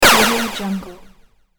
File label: laser echo